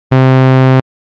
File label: saw